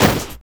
BD, Drum & Bass, 160 BPM

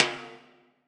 R TIMBALE, Drum Mashines; EdgeSounds; Drum Mashines VOL-1; R-100 DRUMS

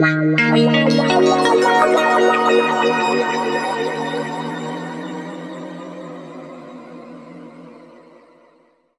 D# P-S WA -R, Guitar Samples; East West; Steve Stevens Guitar Samples Collection; PTCH-SHFT+WA

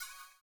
CYM, Ethno Techno; ILIO - Ethno Techno

